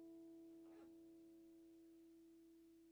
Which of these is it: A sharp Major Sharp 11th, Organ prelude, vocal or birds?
vocal